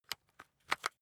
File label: rock drill head remove regular; bohrer; soundpool